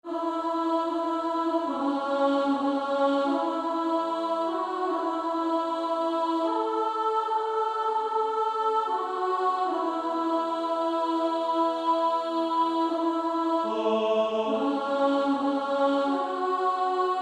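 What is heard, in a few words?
Female Choir, alto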